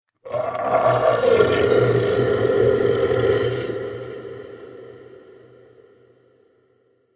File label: Devil Of The Depths, monster sound effect; Monster Sound Effects; Andrew Fleming